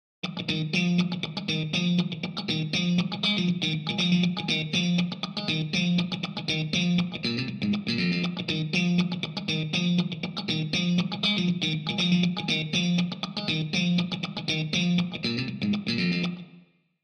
custom59 live g room; digital performer 7

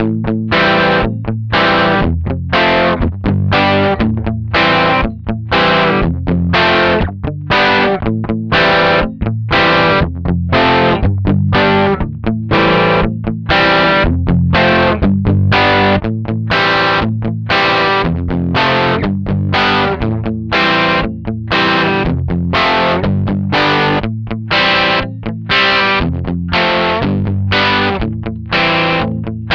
Ampeg GVT clean, Gibson Les Paul; palmer pdi03

